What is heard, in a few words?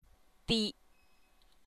tone